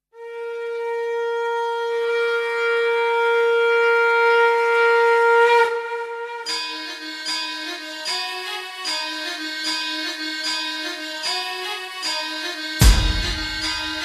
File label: nagada dhol ringtone